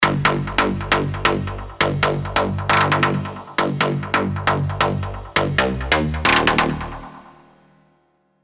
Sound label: Harlequin, serum 2 rolling bass; Revealed Serum 2 Rolling Bass Vol. 1